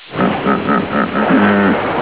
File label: Hippo; geraeusche tiere